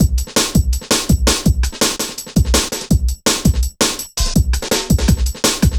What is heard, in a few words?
Programmed Stepz, Jungle Drum Loops